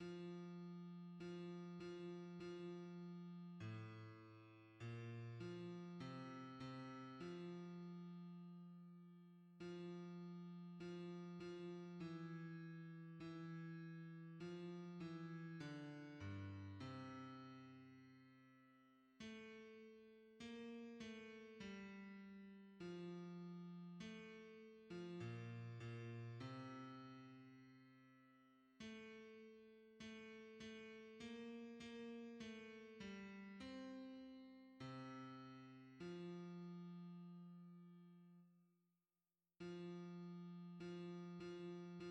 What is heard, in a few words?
bass; Combien il est bon notre Ami divin; hymns